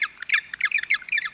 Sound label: coast bird; Half Life Model Pack; Half Life 2; ambient levels